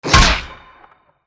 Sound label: Sfx Baseball